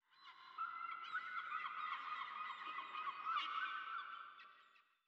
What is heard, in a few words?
ambient battle; World Of Warships